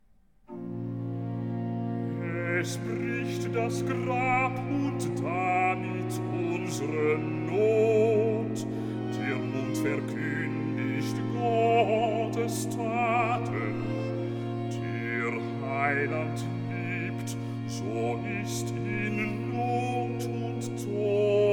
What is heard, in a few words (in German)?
Recitativ (Bass); BWV 66; Erfreut euch, ihr Herzen; Es bricht das Grab